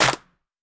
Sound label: clap; foxdot default